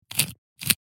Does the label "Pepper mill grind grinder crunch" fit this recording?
Yes